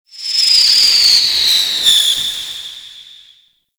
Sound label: hazard spark projectile; Gameplay; Stadium; Warframe; Nineteen Ninety Nine